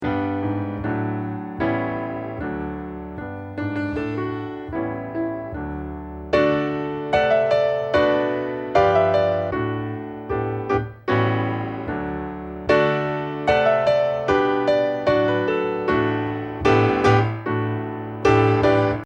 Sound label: accomp